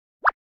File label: cartoon zip; NCH Library